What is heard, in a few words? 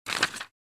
Personal diary page turn